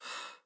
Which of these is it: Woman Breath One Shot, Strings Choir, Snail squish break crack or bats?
Woman Breath One Shot